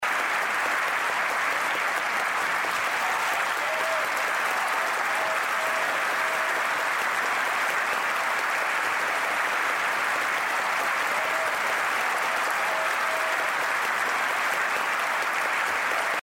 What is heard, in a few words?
crowd; Lucha Rumble